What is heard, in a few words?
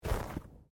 Body movement Slide; Body movement foley to simulate falls and deaths; Bodies; Human